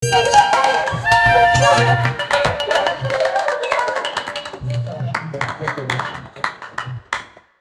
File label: energetic japanese variety, reactions